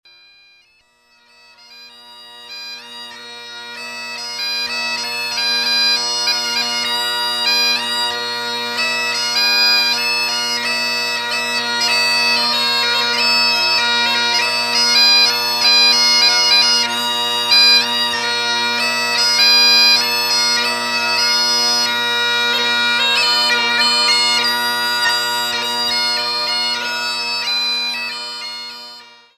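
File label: bagpipe; cornamuse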